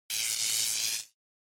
Electric Shock Short

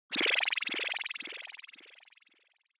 Scene Change Up Delay

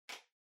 Calculator (Far Switch)

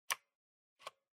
PC Keyboard Shift

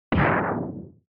Shooter Damage; SNES

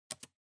PC-Keyboard (Space-Mid)

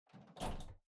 Air Conditioner Far Panel Open

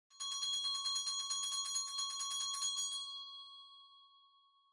Winning Bell Far Strong

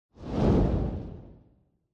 Camera Motion; シーン・カメラモーション

